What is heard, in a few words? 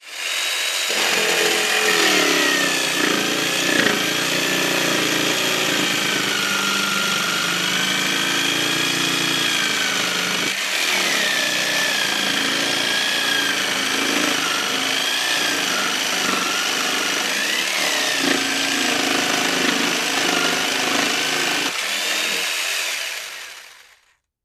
Electric Jig Saw